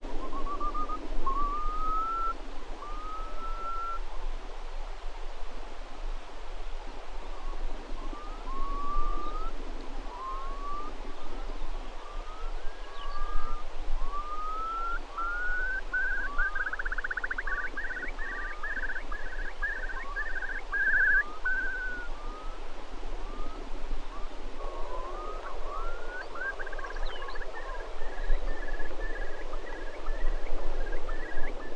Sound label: Blanket bog sounds